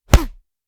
fist punch vocal; breakhack

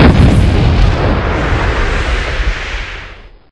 large explosion; tbftss

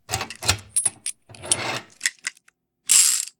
tow rope attach; tbftss

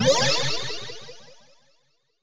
plasma; starfighter

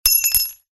mortice key drop on concrete floor; blobwarsAttrition

